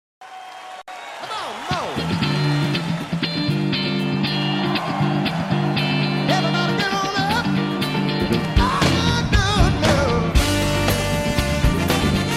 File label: Live w Horns